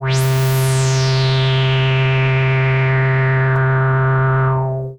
BASS high

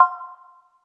Clave C6

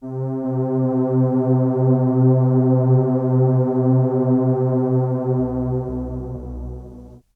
Juno106 Wide Pad Vinyl Synths C2; vinyl keys

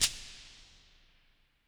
Shaker Lo Verb

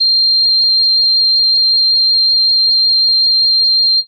Pan Flute DX100 C7

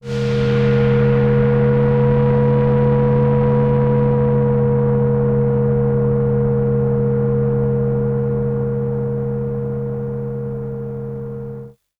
CHORD PAD; Dune Valley; Zelig

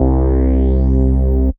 BASS Longer

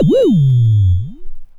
BassStation Pitch Slider Vinyl Synths C3; vinyl fx

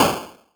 Noise

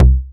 BASS Krockit

